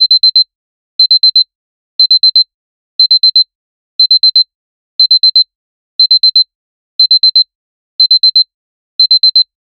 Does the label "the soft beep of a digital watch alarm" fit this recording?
Yes